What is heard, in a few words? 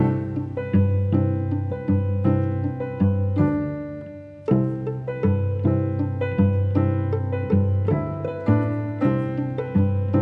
Music Level Bubbles Layer Mix